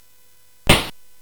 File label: Dark Castle, CSG8580R5